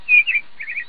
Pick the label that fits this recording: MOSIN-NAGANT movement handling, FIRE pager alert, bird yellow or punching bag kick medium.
bird yellow